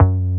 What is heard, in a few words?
BASS, Synth Bass; BA1 Synth-Basses; Sound & Vision - Gigapack I CD 2